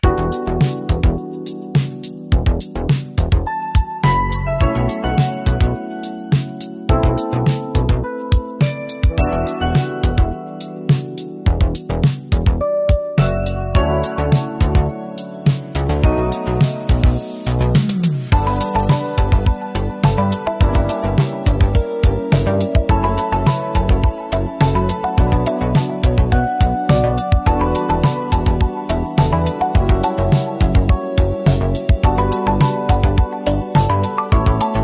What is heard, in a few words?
koto rain, instrumental